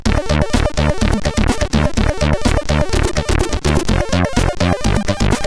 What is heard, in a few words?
Short House, CSG8580R5